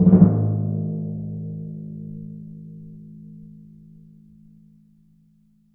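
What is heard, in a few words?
timpani A#, Synclavier Percussion; ILIO; Synclavier Percussion 2; World & Orchestral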